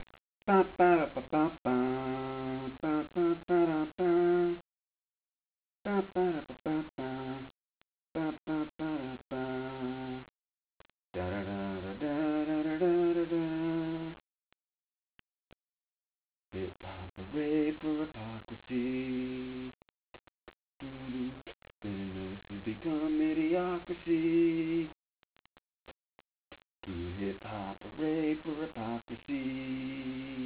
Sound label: vocal line